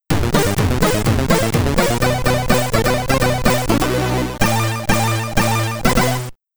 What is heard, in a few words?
Unused (Stage Clear)